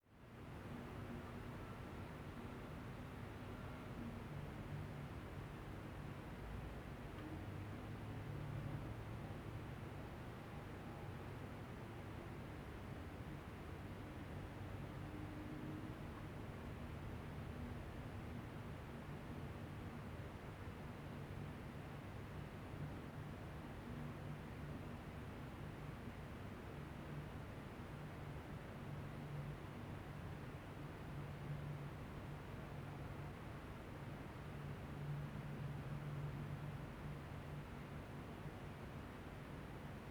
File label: living room, ambiances; CosmicRage; CosmicRageSounds